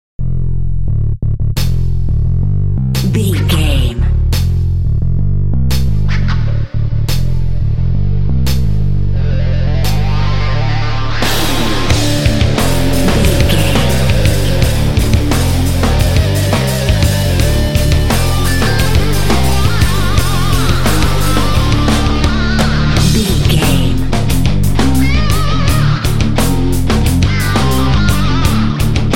mean ole guitar riff

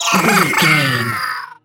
goblin fairy death pain

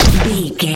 gun silenced rifle 3 shot

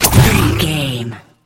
sci-fi shield power on impact

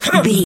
voice female attack

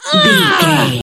voice female hurt pain intense

